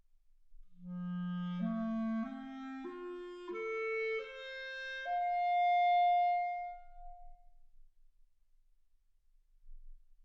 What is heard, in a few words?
clarinet